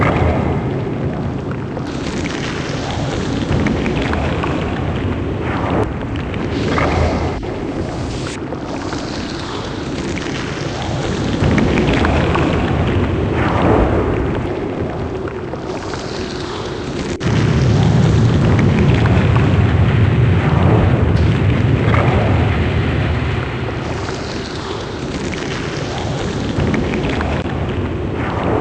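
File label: lava ambience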